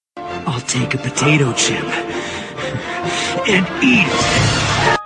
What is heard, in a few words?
potato chip